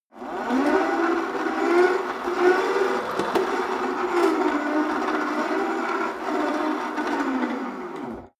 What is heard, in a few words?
rope trainer pull sequence medium long, Fitnessstudio; sport freizeit